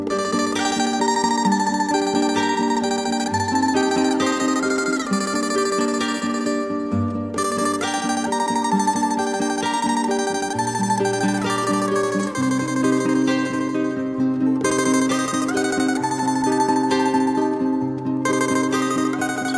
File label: guitar ringtone